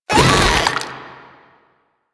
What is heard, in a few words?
Proto Infested Runner Jump Whoosh; Warframe; Abilities